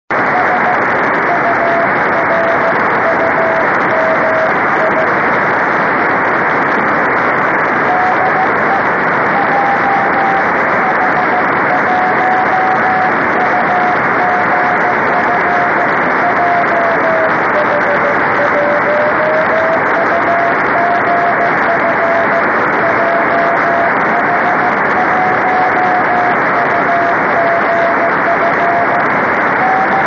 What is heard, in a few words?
24G beacon; 曇時々雨、気温20℃ 湿度85%; JH1UGF 横浜市; JN1AYV 茅ヶ崎市